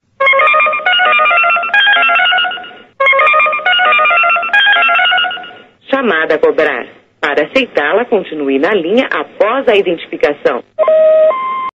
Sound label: trigger, portal; LumiCu 2.0; minecraft